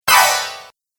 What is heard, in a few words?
Casting Spell, Magic Sound Effects